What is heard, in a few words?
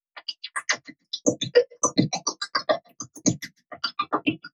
weird perc loop; 6 bpm; M8; percussion and foley